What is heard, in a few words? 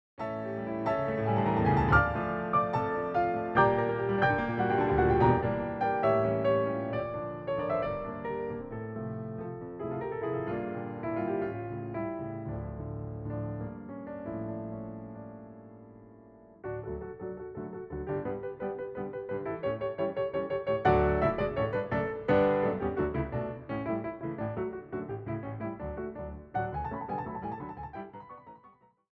Hungarian Dance in D Minor Allegro Non Assai Vivace, piano; brahms; 21 hungarian dances; WoO 1